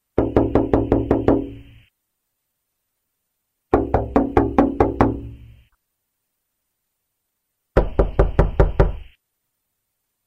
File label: Knock Bomb